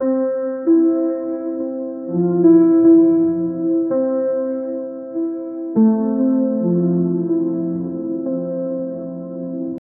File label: cinematic ambien, piano; Create a cinematic ambient background with soft piano notes